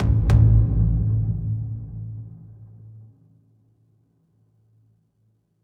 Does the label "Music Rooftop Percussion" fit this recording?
Yes